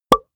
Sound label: pop